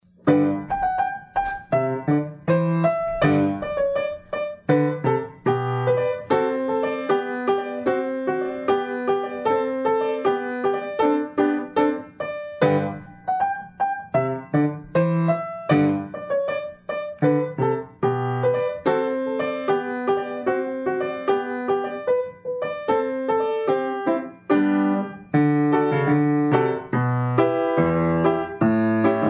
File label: Russian Dance Trepak Nutcracker